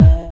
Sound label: DR. LOOP, DRUMS; X-Static Goldmine 3